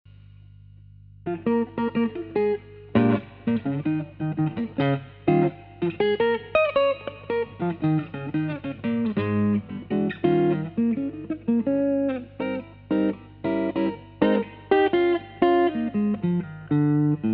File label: Bb blues